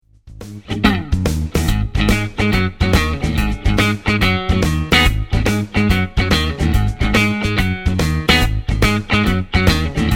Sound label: Clean Mid, Strat; Middle; schwarzbach; VP-Strat-IrishTour